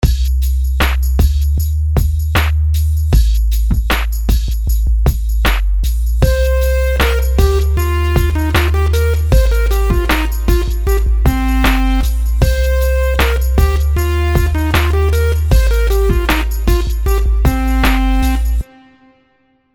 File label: rhythms simple 16ths